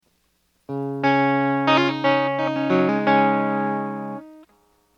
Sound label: rock